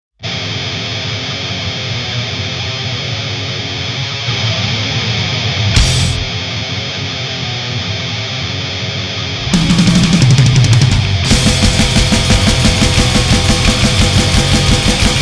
black metal

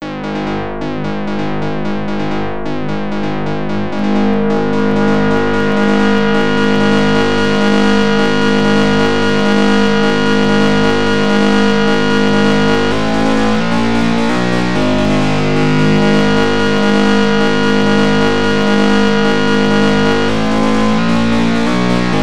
overdriven 130

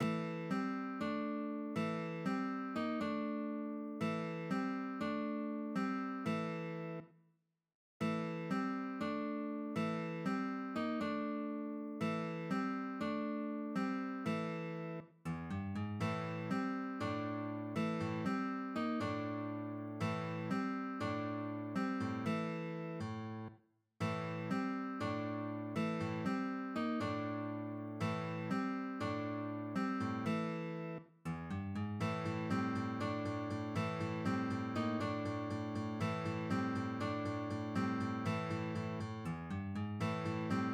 acoustic

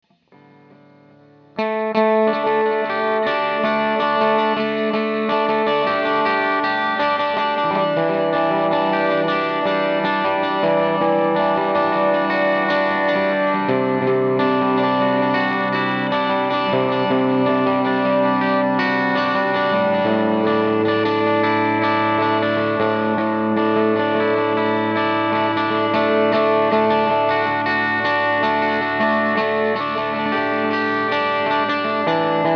echo

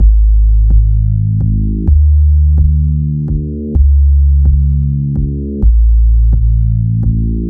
dx deep

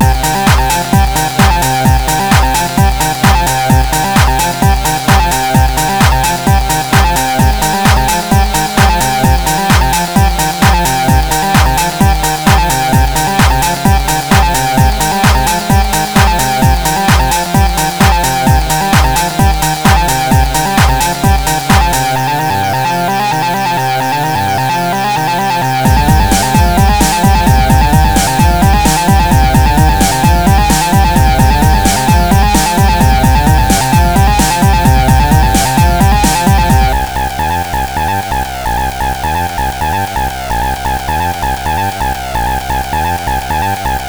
acid tune

